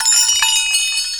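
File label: 100 BPM; RHYTHMLOOPS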